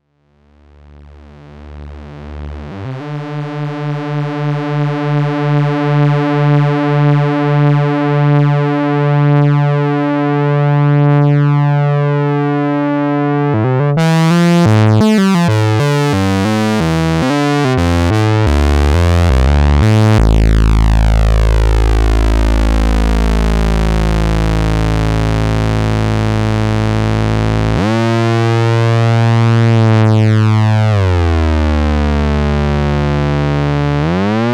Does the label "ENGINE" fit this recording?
No